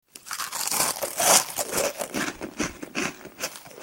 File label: eating; gmod; perp2.5